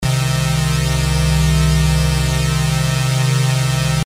Chorus, The chorus effect, for example, uses an LFO to modulate the pitch of a duplicated signal, creating a shimmering, thickening effect.; low frequency oscillator